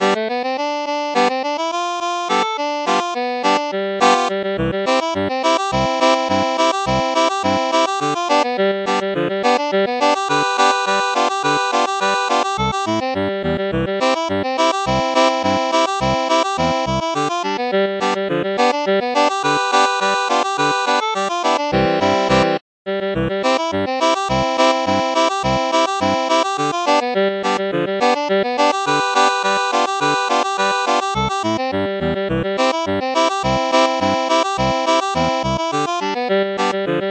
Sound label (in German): 1 Stimme 2 Harmonikas